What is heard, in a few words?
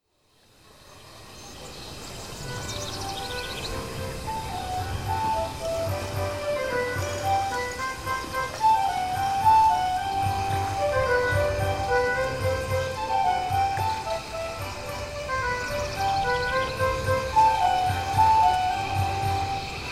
Soundscape Version; Jungle Cruise; The Legend of Zelda Majora's Mask